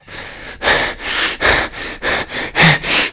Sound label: player climb breaths; player climb breaths tmp